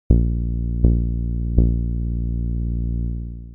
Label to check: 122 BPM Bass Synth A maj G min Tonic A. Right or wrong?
Right